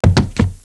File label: body drop; svencoop; echoes